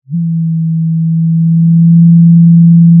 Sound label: pop, Sound of a cruise ship horn